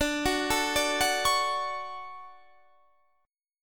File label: D Major 7th, chord; DM7 arpeggiated